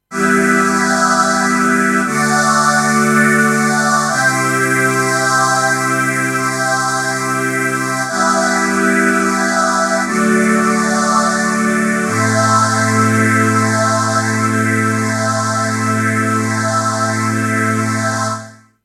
Vintage String Ensemble Moogerfooger 12 Stage Phaser